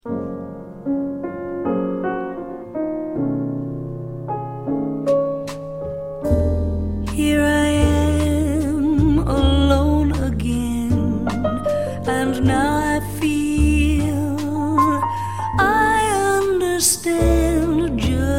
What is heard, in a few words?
bossa